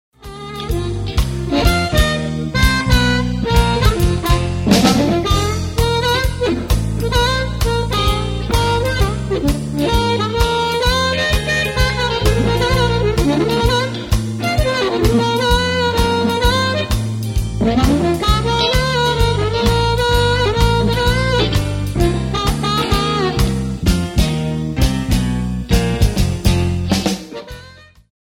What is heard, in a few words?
blues